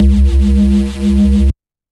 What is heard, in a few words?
Axis Dub, bass; Ableton; Glitch and Wash